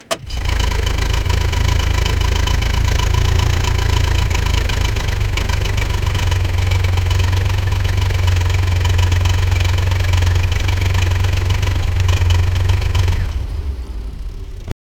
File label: bmw m3 gtr, racing